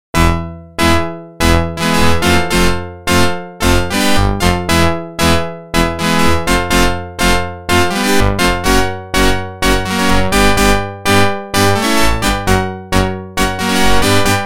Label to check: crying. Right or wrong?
Wrong